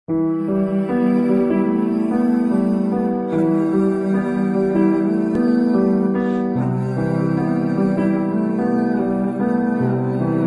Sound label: Turkmen rap